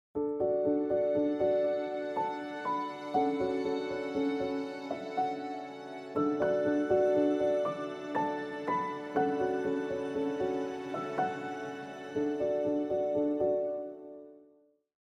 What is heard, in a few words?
Pensive Classical Theme; KCTS